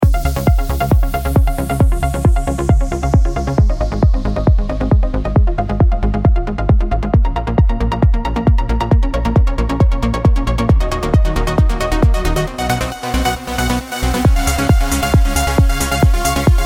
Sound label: uplifting trance